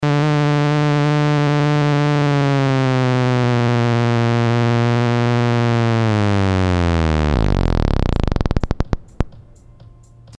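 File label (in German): Störgeräusch, extreme Störgeräusche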